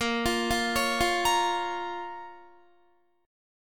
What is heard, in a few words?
A sharp Minor, Guitar; 17,20,17,18,17,18; 4F,4A,5C,5G,5C,6E; arpeggiated